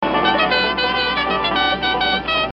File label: horn; dukes of hazzard general lee s